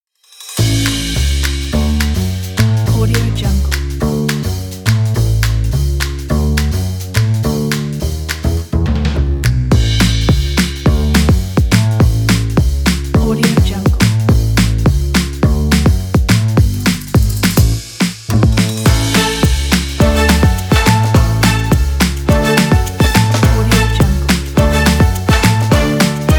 upbeat jazz; audioenergy

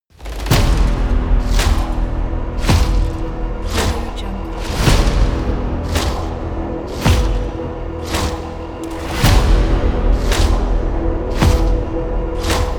medieval vikings cinematic pack

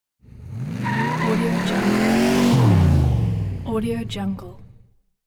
car starts with tires screeching

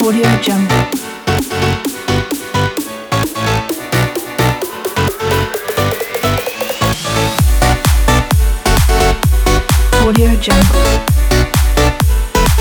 summer party logo

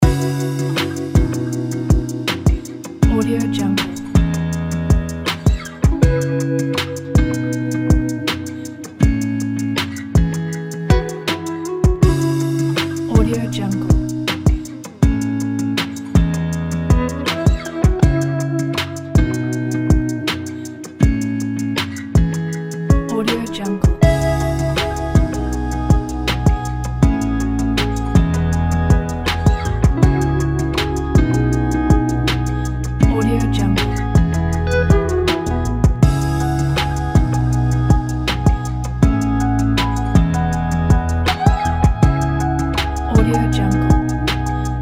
chill travel background music

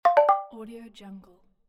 message

